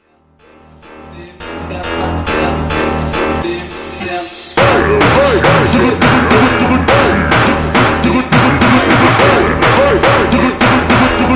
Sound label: slowed reverb; jelektronika